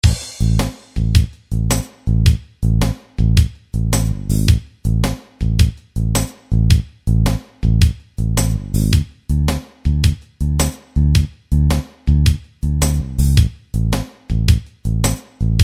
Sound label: blues in a, gitara